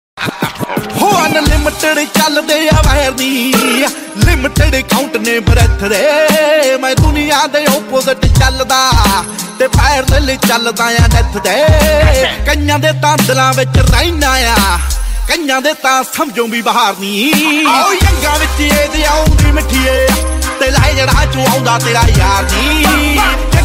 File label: Attitude Ringtone